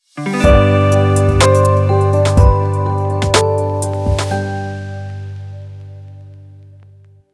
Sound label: simple logo